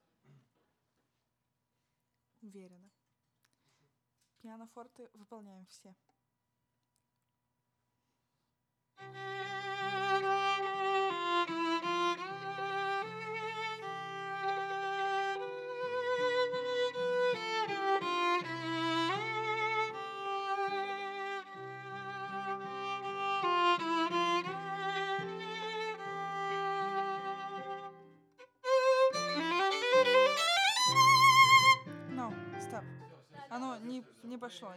Viola D

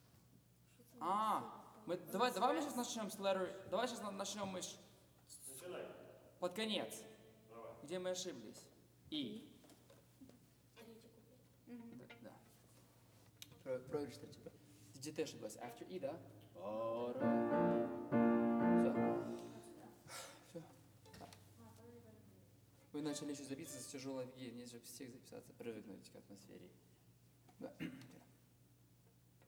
Overhead

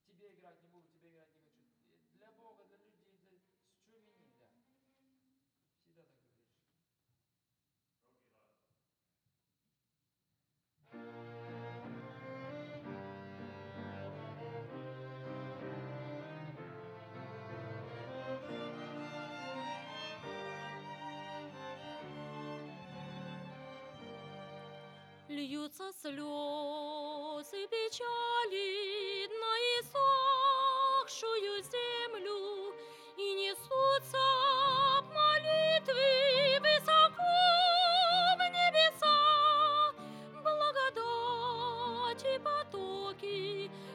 Vocal

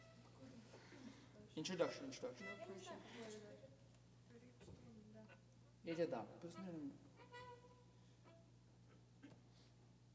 Overhead

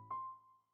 Piano

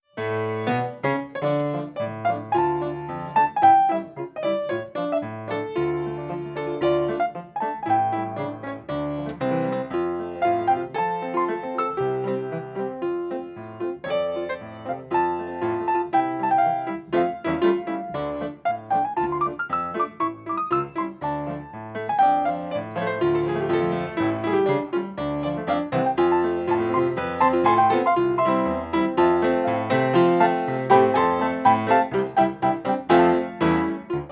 random piano

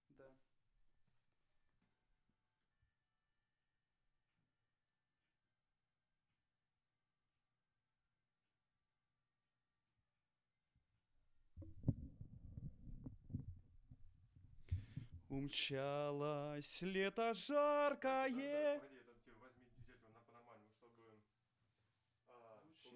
vocal

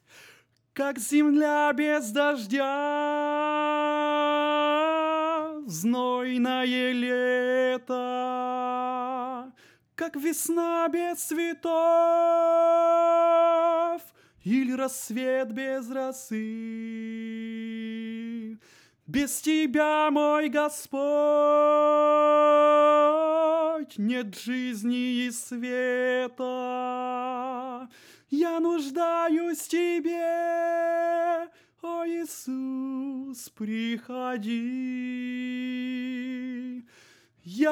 tenor

